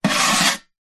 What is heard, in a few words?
air fryer oven metal grill tray remove slide out; household